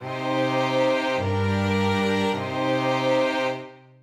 cadence natural minor

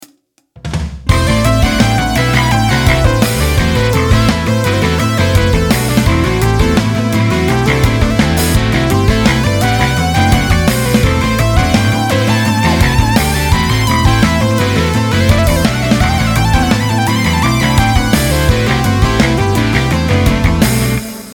dorian impro

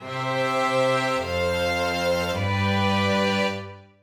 cadence half

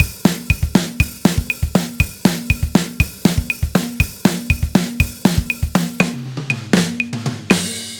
time feel double

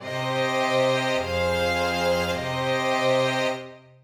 cadence plagal minor

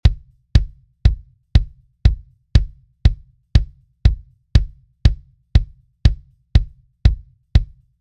env good kick

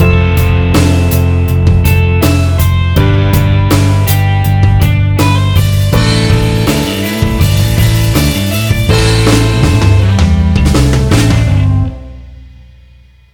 VIsus4 m

